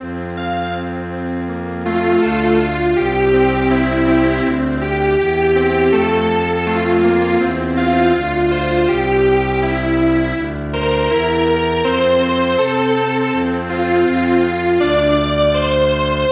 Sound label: Strings Quartet